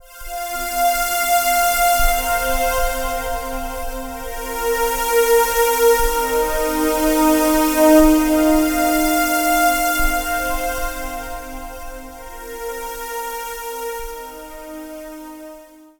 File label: Pad, Trance; Sonic Foundry (Sony Creative Software); Trance NRG VOL-2